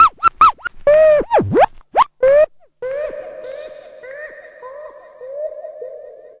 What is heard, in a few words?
sweet scratching into echo; Scratching and Turntablism; DRUM LOOPS and BREAKS